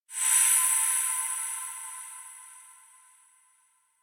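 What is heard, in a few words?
electronic pendant glow; anemoi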